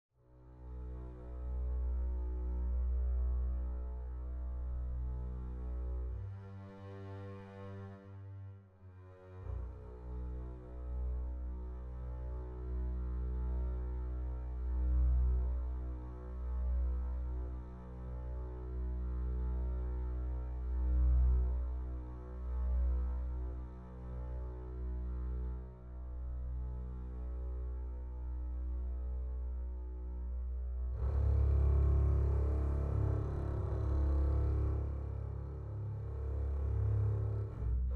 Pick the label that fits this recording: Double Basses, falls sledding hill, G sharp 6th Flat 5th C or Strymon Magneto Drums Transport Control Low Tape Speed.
Double Basses